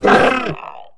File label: twohead hit; Star Wars Knights Of The Old Republic I; XBOX